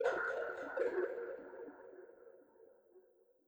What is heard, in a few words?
Jar